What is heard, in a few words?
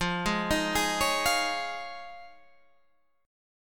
F Minor 7th Sharp 5th, Guitar, Open G; down; chord shape